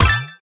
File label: collect; pinball